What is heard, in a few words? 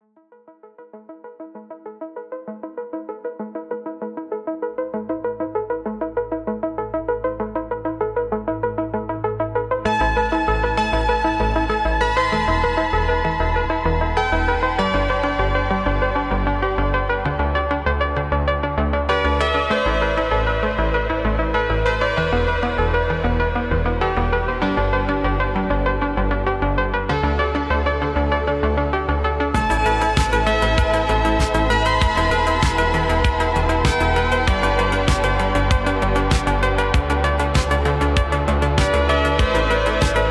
Electronic Impulse